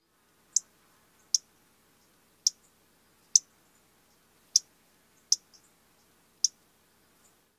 calls

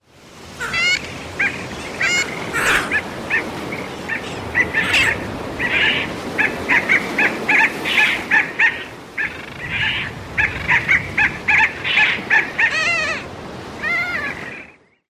calls at colony

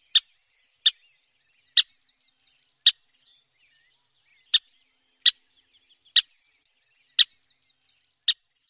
kit calls

